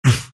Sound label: big pain; Undying